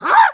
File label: Ahh; Ravio: "Ahh!" (surprised); zelda